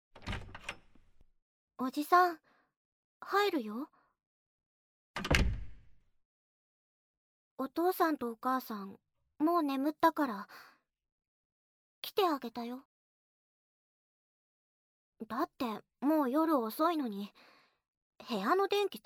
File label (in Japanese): 子守唄; 子守唄を聞きながら、赤ちゃんオナニー